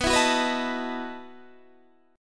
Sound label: E Major 7th Suspended 2nd Suspended 4th